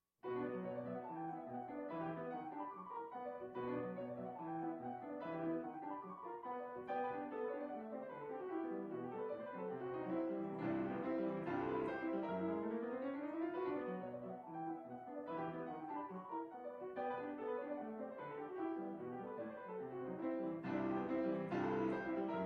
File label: Piano